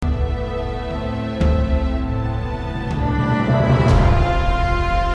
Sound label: Game Tune